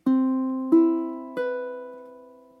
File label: chord ceh, ukulele